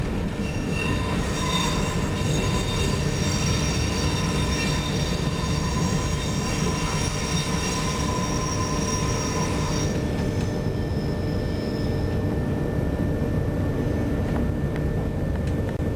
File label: Flange, Train; RBDe560